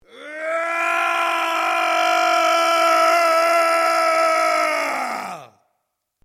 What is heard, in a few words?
MALE ROAR AGGRESSIVE LONG; male vocalisations; Yelling Sound Effects; Yelling (Sound Effects:Voice)